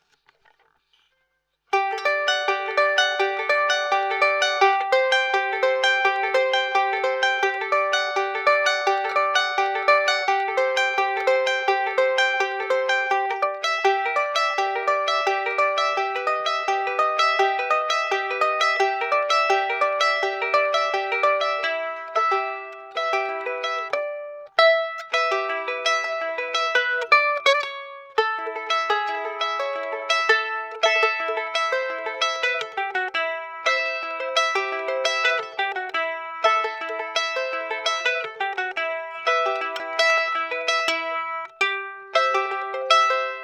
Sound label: Charango fingerpick RAW PIEZO